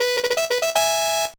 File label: Jingle; animal mania dokidoki aishou check gba